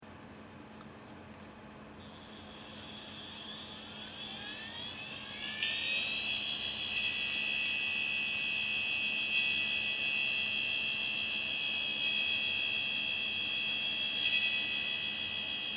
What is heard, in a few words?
hard drive buzz